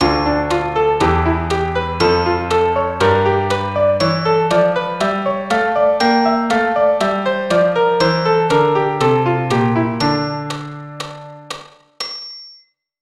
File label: Dm melodic